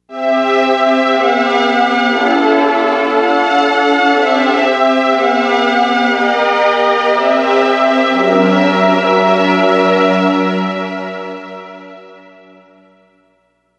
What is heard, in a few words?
Progression, chord progressions